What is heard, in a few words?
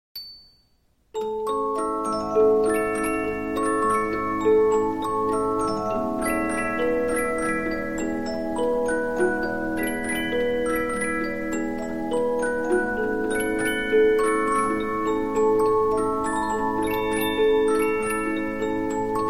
Polyphon, 15inch disc musicbox